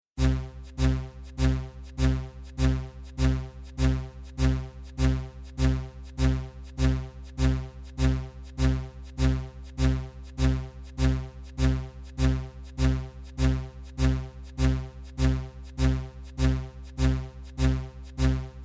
Scifi Timer Seamless Loop; Sci Fi Music; Royalty Free Sci Fi Music

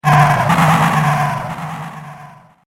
Horror Fearful Movement; Evil Sound Effects

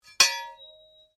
Blade Heavy Moving Impact, Sword

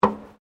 FOOTSTEPS WOMENS LOW BOOTS ESCALATOR STEP; Footstep Foley; Footsteps (Sound Effects:Human)

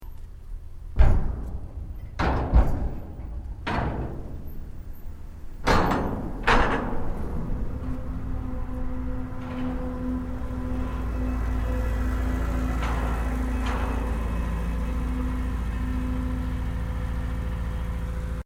Boat Ferry Car Loading Car; Wisemans Ferry Nsw; Boats (Sound Effects:Vehicles)

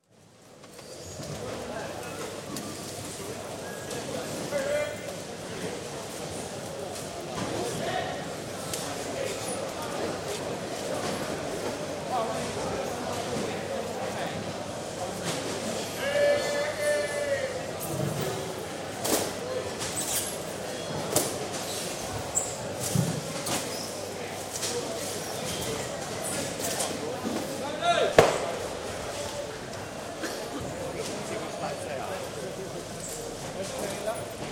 Fish Market atmosphere; Billingsgate; Stores And Mall; BBC

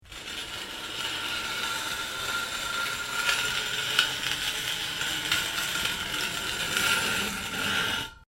Metal Grind Scrape Large Rock Along Metal Bar; Long metal scrape sound made by dragging a large stone; Metal Sound Effects; Lynne Music

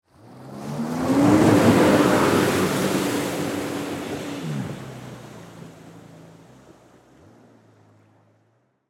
Jet Ski Away Fast, Watercraft; Transportation; Boats

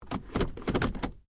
Hyundai Accent Auto Gear Shift; Hyundai hire car; Hyundai Accent 20 08; Cars; Vehicles

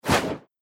Texture Whoosh; Whoosh Sound Effects

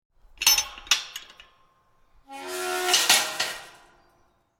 Metal Gate, Opening and closing of iron gate.; Gates; Doors

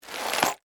Industry Box Nails Set Down Movement; Factories; Backgrounds

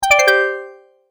multimedia tone alert

